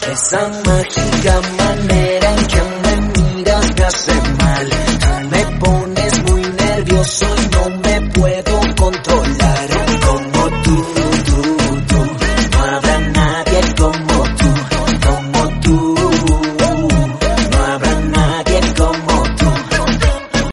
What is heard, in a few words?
reggaeton ringtone